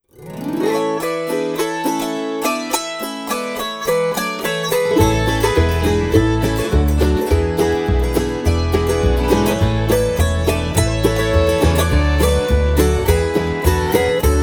autoharp fragment; Kapitan Kidd

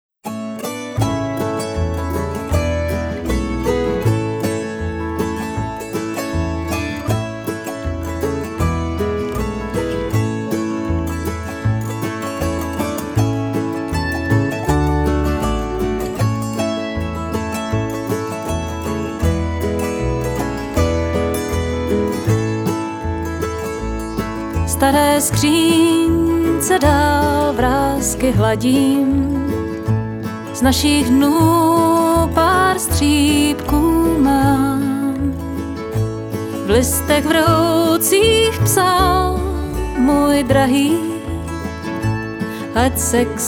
autoharp fragment

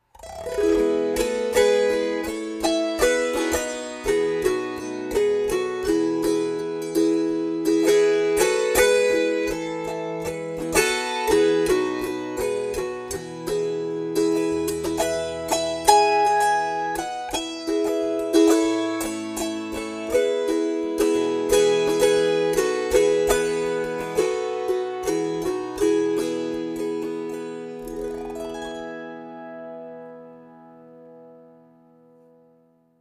autoharp

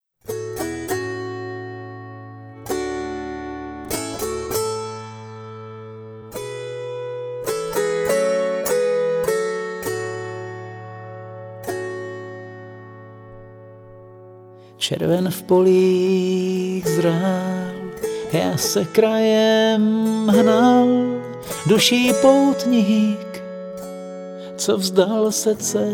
autoharp

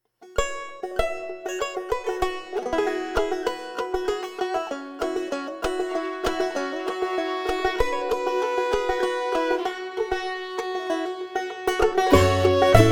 banjo fragment; Krehka Sadie

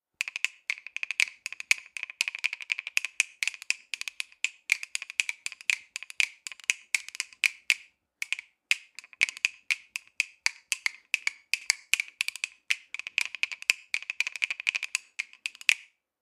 bones

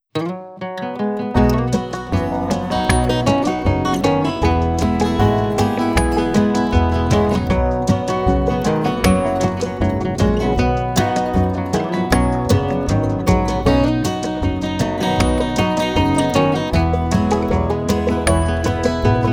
guitar fragment

